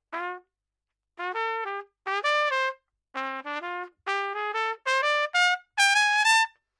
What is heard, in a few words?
slow